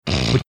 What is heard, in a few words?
snorting